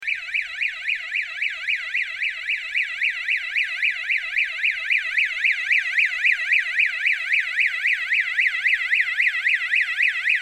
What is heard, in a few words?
House Alarm; Acclivity